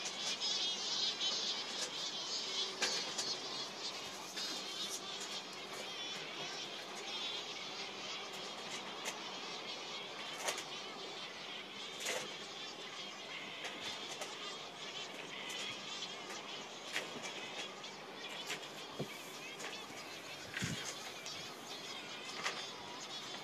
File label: birds; sao jorge